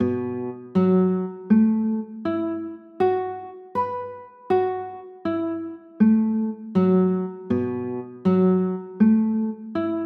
b 7sus4, chords

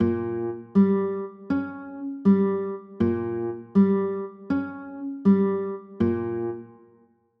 e flat 7, chords

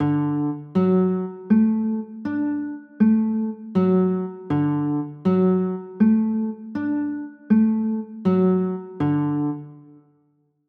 d maj, chords

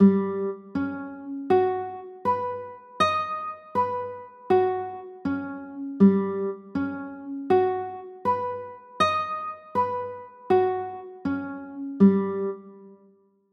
a sharp 6 9, chords; a 13 sharp 11

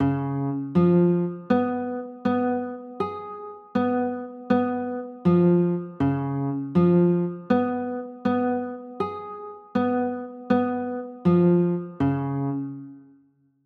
c sharp 6 9, chords

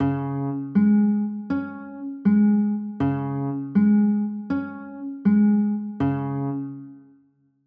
c sharp 6 9, chords; c sharp 5

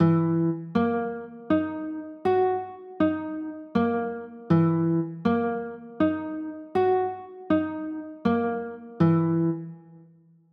f sharp 9; f sharp 13

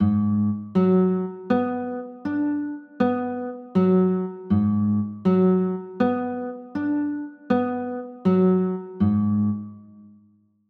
a flat m7b5, chords

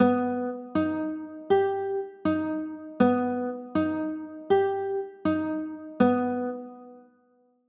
g aug

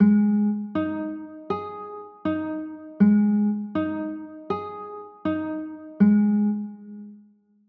a flat 6

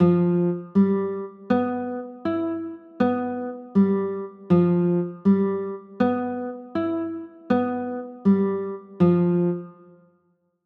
g 13, chords

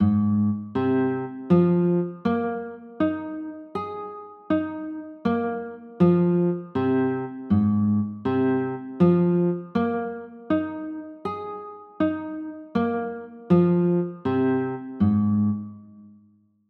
a flat 6 9, chords

